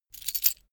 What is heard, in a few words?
key ring large put down; objekte; schluessel